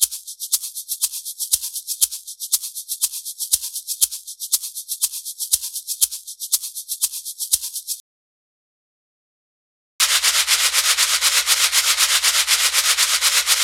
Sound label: Shaker 120 BPM; Перкуссии (Percussion Loops)